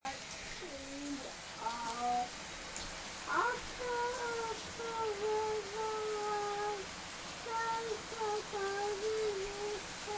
sings in the shower

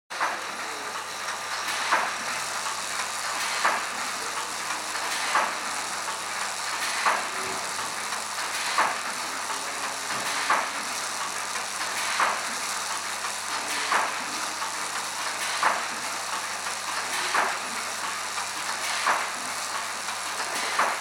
poppy making machinery

